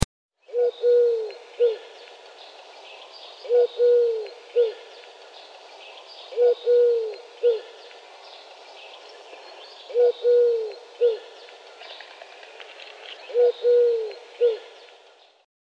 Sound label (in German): Türkentaube Balzruf